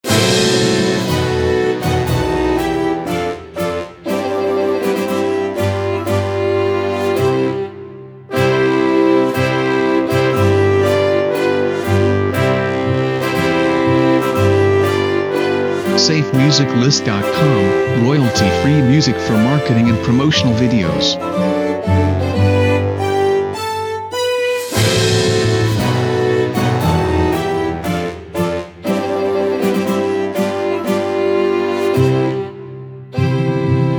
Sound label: The Wedding March Orchestra